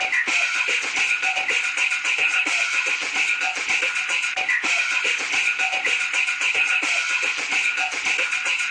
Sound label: 110 trance brazil reverby, DRUM LOOPS and BREAKS; 101 to 110 bpm